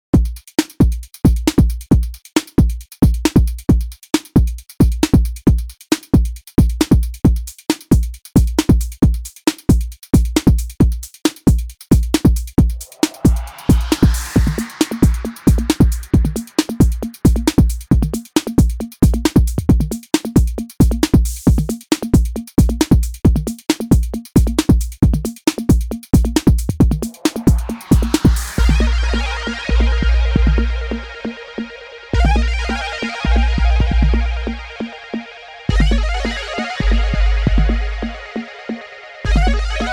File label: electro perk; kford oxium